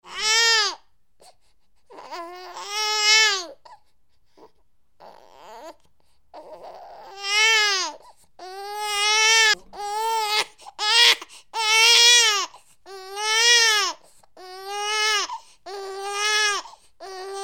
Tieng tre so sinh khoc oe oe

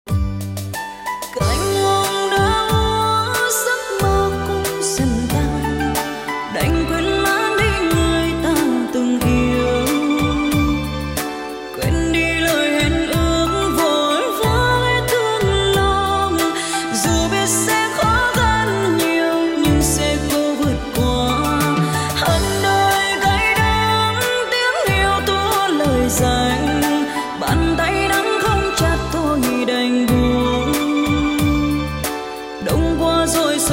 Nhac chuong giong nu cover; tiktok

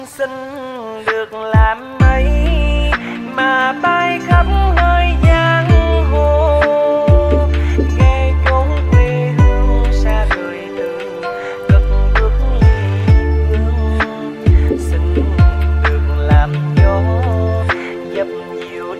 Nhac chuong lofi

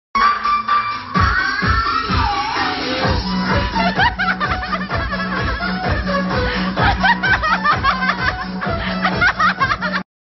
Nhac remix va tieng cuoi; TikTok

